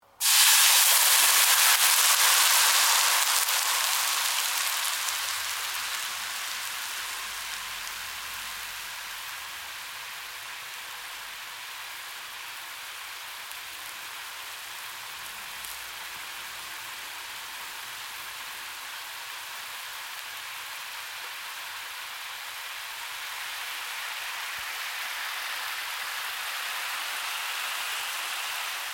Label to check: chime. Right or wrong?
Wrong